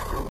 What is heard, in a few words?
Slide; friday the 13th killer puzzle